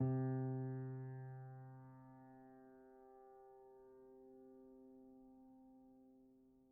GPiano sus C2, Grand Piano, Kawai; Chordophones; Sustains

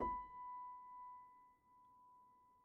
Upright Piano, Sustains, B4; Chordophones; Knight

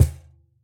Cajon hit f; Idiophones; Struck Idiophones

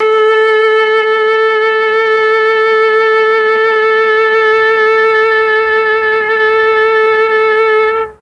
A3 viola, mellotron

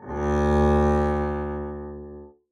arco d2 f up, double bass; dsmolken

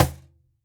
Cajon hit fff; Idiophones; Struck Idiophones

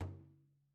Hit Muted, Frame Drum; Membranophones; Struck Membranophones

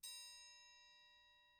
triangle hit mp; Idiophones; Struck Idiophones; Triangles

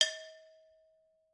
Brake Drum Susp, Struck Idiophones

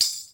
tamb, Tambourine

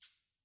Cabasa Rub; Idiophones; Struck Idiophones

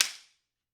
slapstick; Idiophones; Struck Idiophones

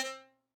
Harmonica Hohner Super64 Normal release C3; Aerophones; Releases; Free Aerophones

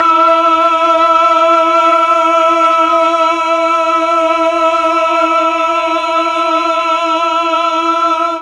E3 8VOICE CHOIR, mellotron

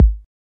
kick, 808 mini; drum machines

